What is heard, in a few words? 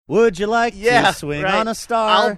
singing